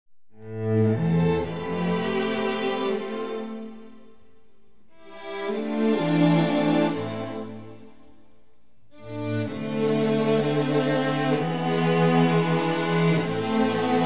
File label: INSTRUMENTAL